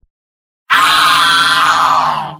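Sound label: spy pain critical death; tf; mvm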